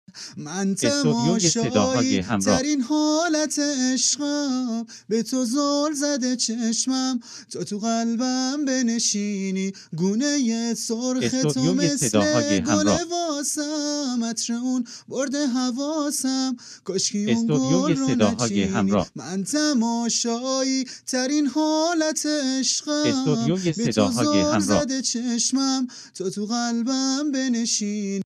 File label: mix master vocal